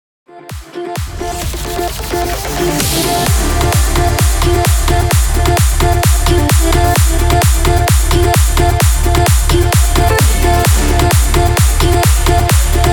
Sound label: 130 BPM